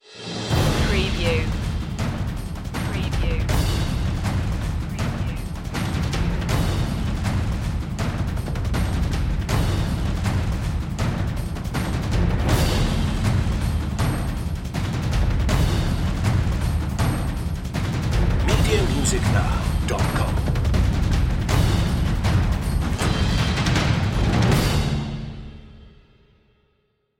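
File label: percussion mix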